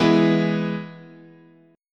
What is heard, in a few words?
E Major, chord